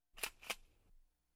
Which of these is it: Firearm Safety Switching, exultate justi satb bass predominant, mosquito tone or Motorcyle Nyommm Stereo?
Firearm Safety Switching